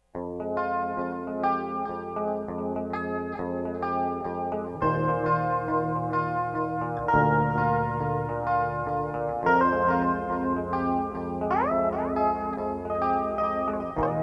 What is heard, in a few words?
Anthem